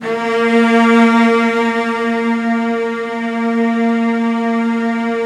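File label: CELLOS C4, Strings; ILIO; Synclavier Strings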